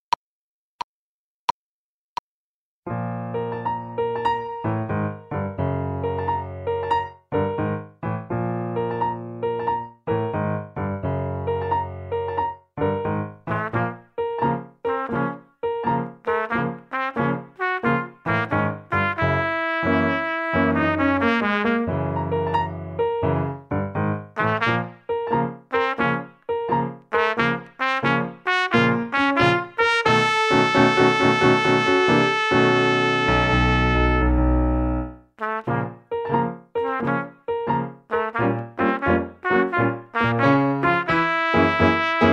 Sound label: All Jazzed Up Tpt